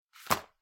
ゴム手袋を置く音

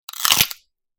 骨が折れる音

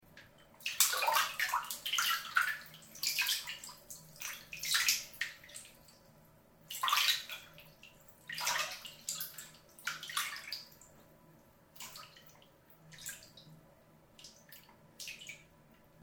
お風呂のお湯で遊ぶ 小さいチャプチャプ音

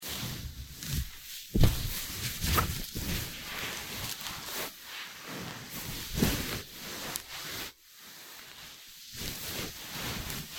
布擦れ音

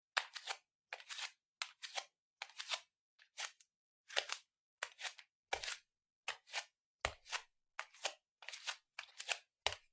フローリングを裸足で歩く

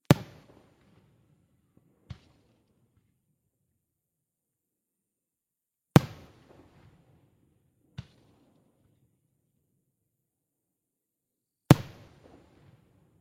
花火の音 大きい; firework

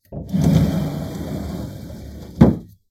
襖をあける音; door

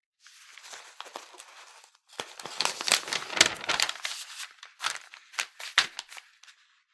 新聞紙をめくる音

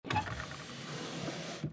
日本の民芸箪笥タンスを開ける音; chest

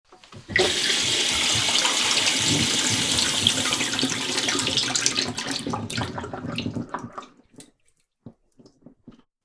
洗面台の蛇口の音

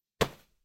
スマホをシーツに投げる音

